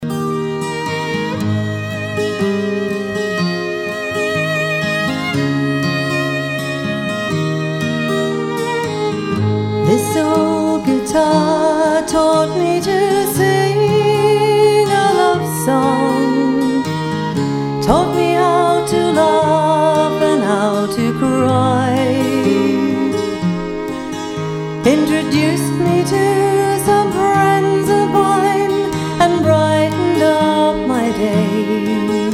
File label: guitar